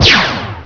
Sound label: gun; asciisec